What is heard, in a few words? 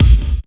KICK hardcore, Techno Trance DRUMS